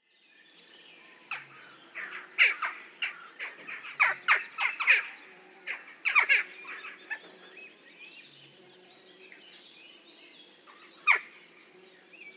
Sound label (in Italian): Taccola Coloeus monedula